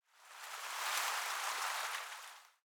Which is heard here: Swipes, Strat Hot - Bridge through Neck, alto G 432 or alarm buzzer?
Swipes